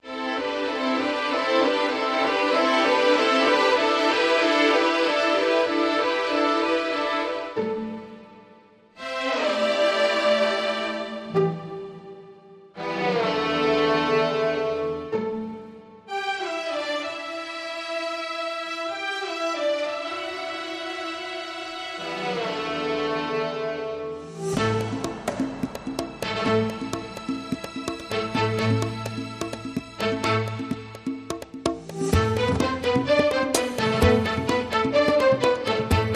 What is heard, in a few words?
No GTR